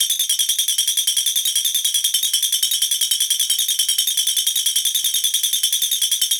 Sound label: 16TH 150BP; Bolder Sounds - Granular; LP 16THS 150